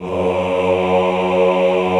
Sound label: AHH F1, choir; Best Service; Extended Classical Choir; AHH MIX